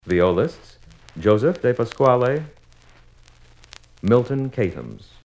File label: Violists